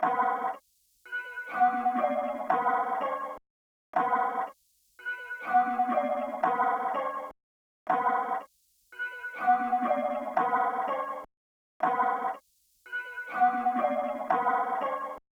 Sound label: 122 BPM B Min Crushed Synth; Loop Stems; Cymatics; 2022 Melody Collection Platinum Expansion; Schism